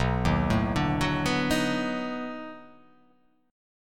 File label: B 7th Sharp 9th, down